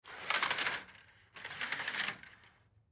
Curtain Slide Open, Curtain Slide Open Slow; Windows; Household; Blastwave

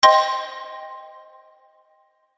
Xylophone Horror Chord Strike

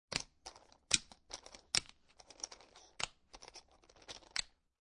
Poker Chips Collecting From Table; Poker, gambling, chip; Chips clicking

